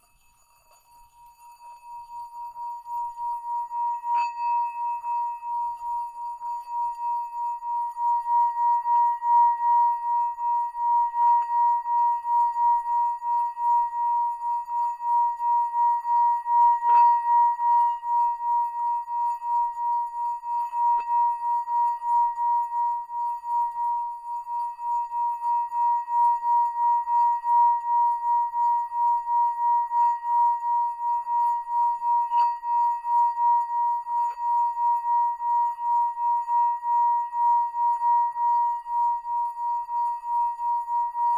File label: Small Painted, himalayan bowls